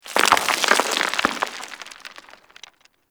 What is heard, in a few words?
Rock Slide Medium; Warframe; Eidolon Plains; Ambience